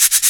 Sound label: Shaker Hit; Ambient Grooves